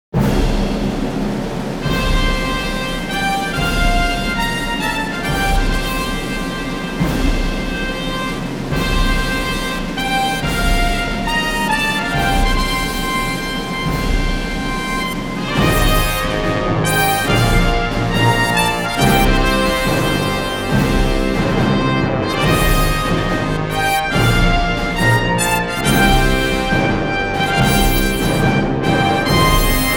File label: Fanfare; 로고